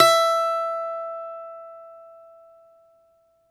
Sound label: BALALAIKA, Russian Strings; E-MU Producer Series Vol. 7 – Old World Instruments